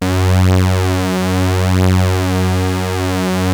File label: OB F#3, Rare Synthesizer; Hollywood Edge - Giorgio Moroder Rare Synthesizer Collection; OB 4 VOX.